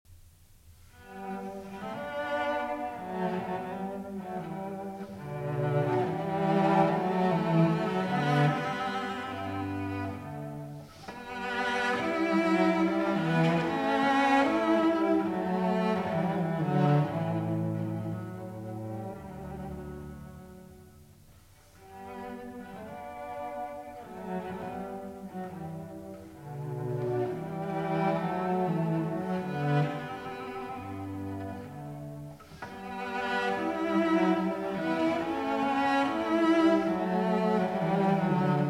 Sarabande; Couperin, F Les Goûts-Réunis ou Nouveaux Concerts, Treizième Concert III.

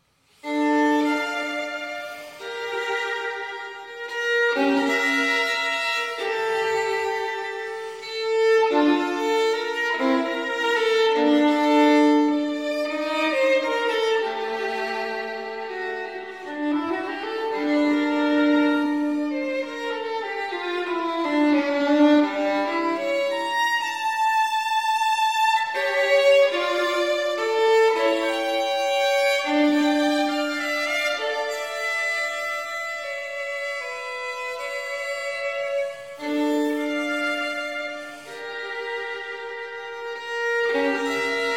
Partita in D Minor for Solo Violin; Partita No. 2; BWV 1004

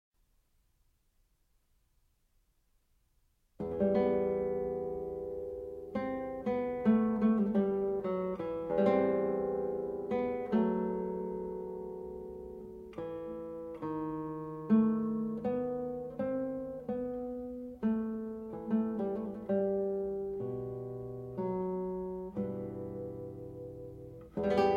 Lute Suite in E Minor For Guitar Sarabande; BWV 996

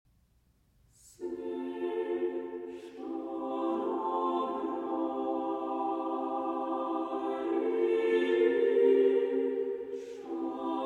Version For Vocal Ensemble; Habitare Fratres in Unum

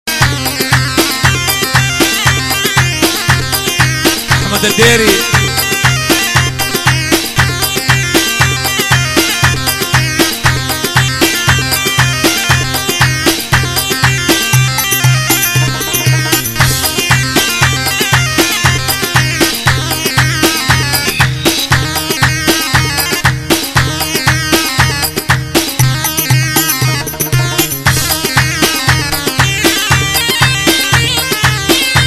Live Arabic Dabke

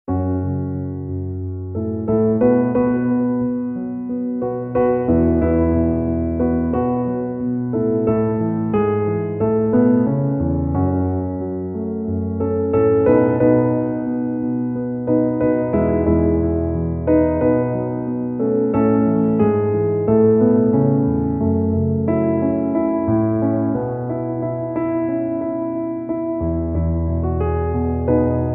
Piano Instrumental

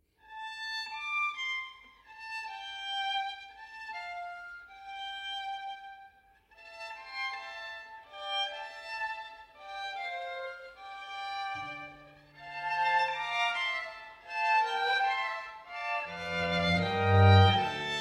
String Quartet in D Minor

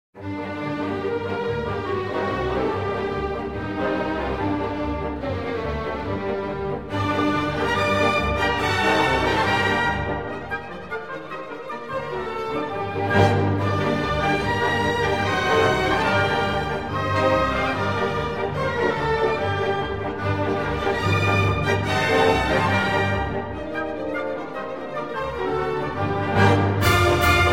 Hungarian Dance in G Minor, For orchestra; Hungarian Dance, WoO 1 No. 5 in G Minor; Arr. Schmeling

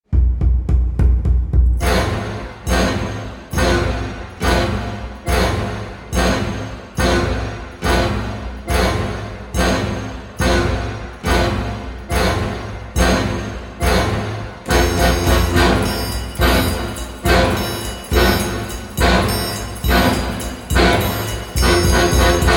Portuguese Dance

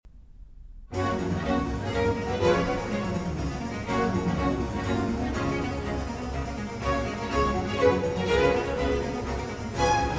Cello Concerto in G, Allegro; Vivaldi; RV413

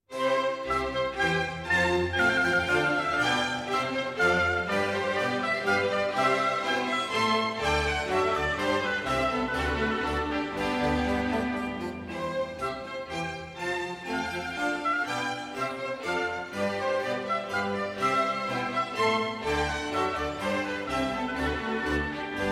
orchestral suite, menuet; No. 1 in C major, BWV 1066

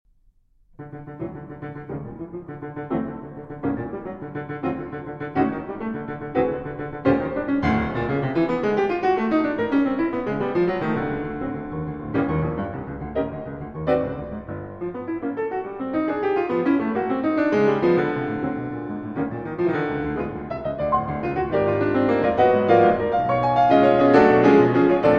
Piano Sonata in E Major II. Scherzo Allegro Assai

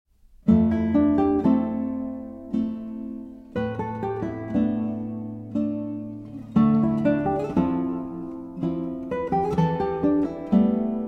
Guitar

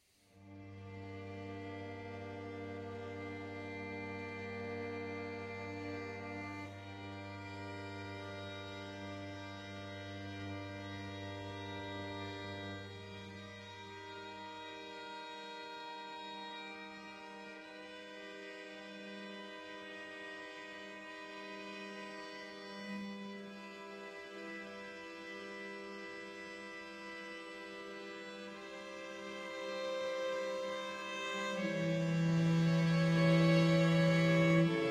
Consonanze Stravaganti, Strings; Trabaci; Arr. Montanari

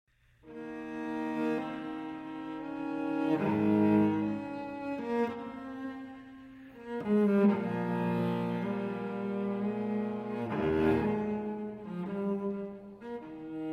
Unaccompanied Cello Suite E-Flat Major Sarabande; BWV 1010

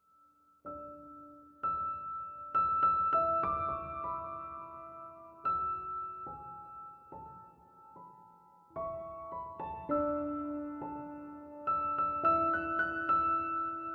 Emotional Piano

